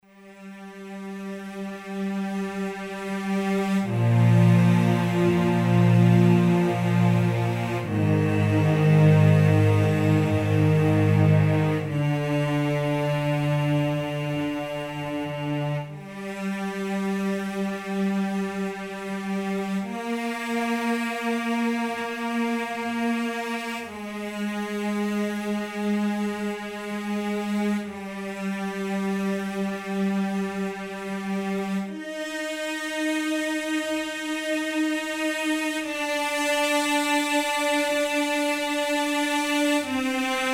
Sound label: Strings